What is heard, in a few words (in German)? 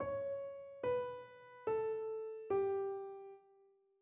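Tetrachord Lyd cis ab, lydisch; Tetrachorde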